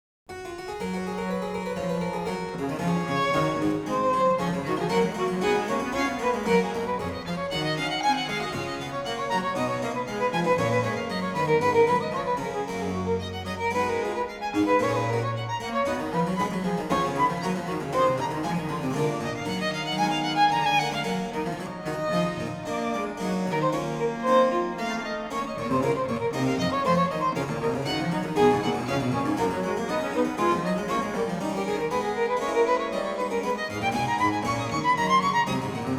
Sonata in G major for violin & basso continuo, Presto; J.S. Bach; BWV 1021; Orchestral Works & Chamber Music